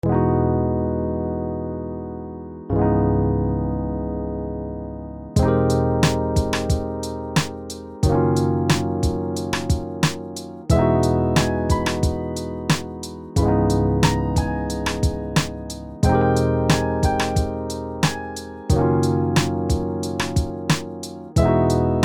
LO FI

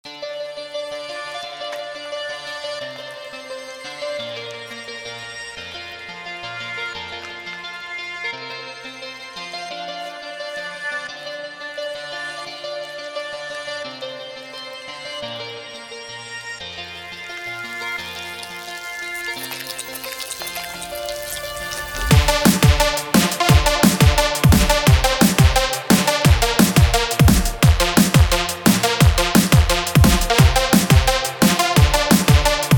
Rave

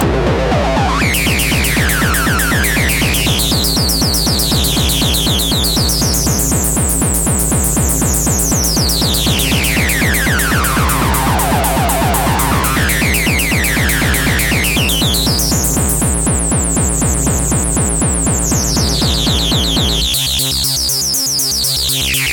Hardcore

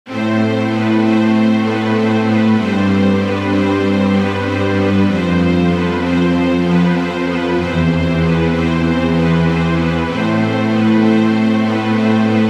Hollywood Violins

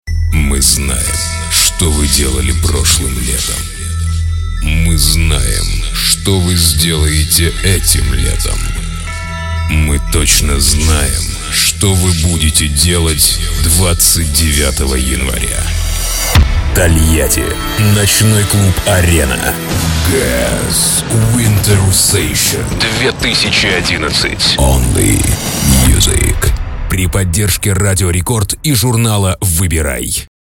only music

